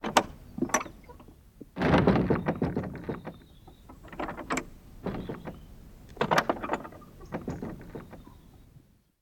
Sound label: Door dovecote fiddle; the 39 steps